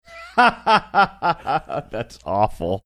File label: laughing saying that's awful